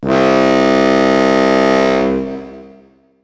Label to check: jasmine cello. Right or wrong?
Wrong